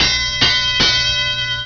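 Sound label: FIRE ALARM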